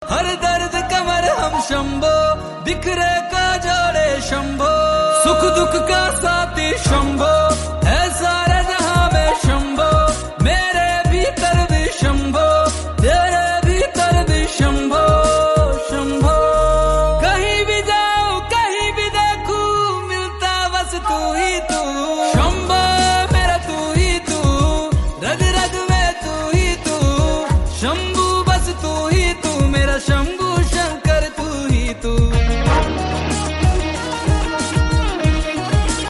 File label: Hindi Bhakti Song